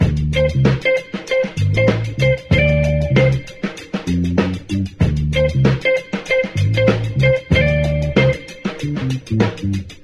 full gunky funk, Loops Phonky

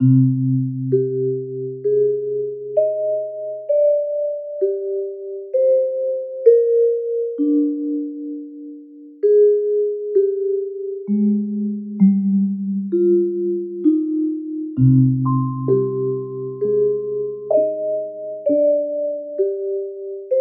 vibes slow burn; Loops; Sad

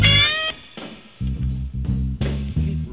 guitar, Loops, Phonky

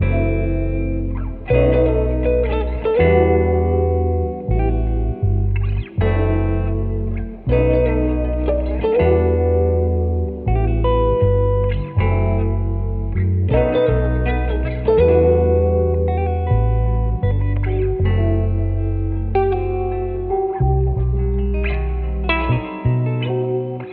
guitar, loops

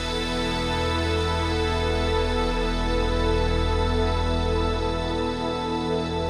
airy, Synths; Notes

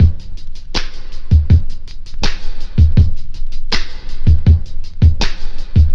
klap, Drum loops Boombap

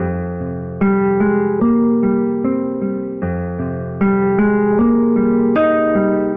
guitar, Sad; Loops

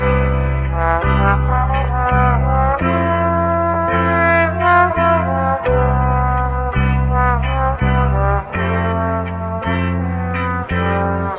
horns resentment building, Sad; Loops

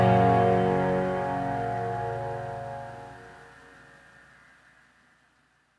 ride, synths; Notes

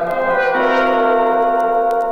horns, Loops

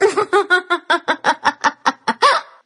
laugh

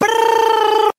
brrrrrr, Voice; ooh ahh

